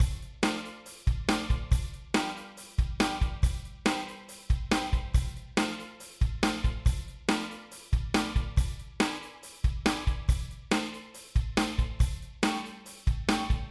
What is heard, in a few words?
Drum Chord Progression; eventide temperance pro